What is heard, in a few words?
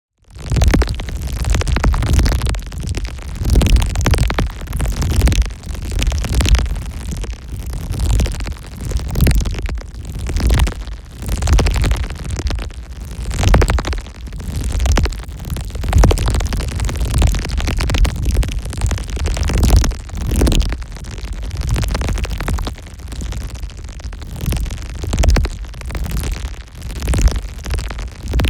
NEON PARTICLES LARGE FAST; Atom Smasher; boom library